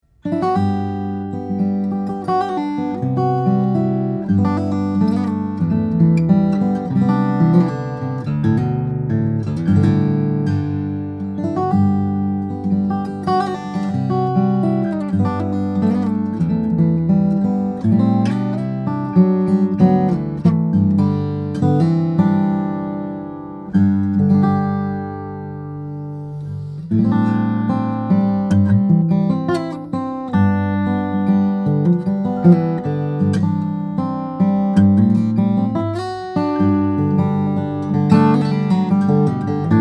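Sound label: guitars, steel-string guitars, flattop; cocobolo mastergrade cedar; Goodall CCJ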